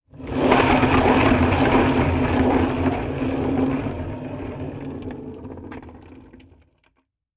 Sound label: Foley Wood Shelf With Wheels Rolling On Cement